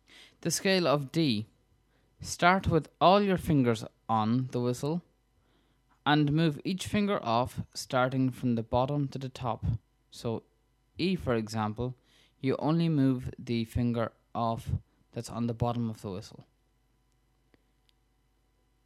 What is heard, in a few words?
Scale of D